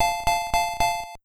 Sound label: Alert